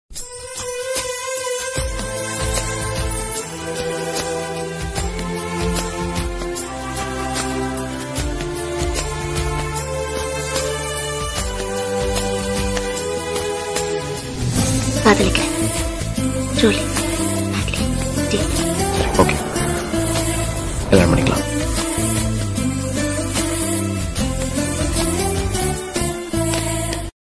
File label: love bgm; kaka kaka; jothika